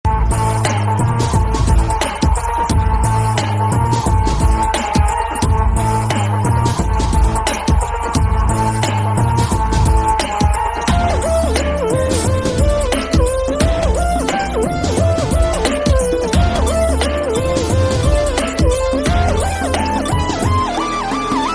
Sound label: Background Music Ringtone; Khiladi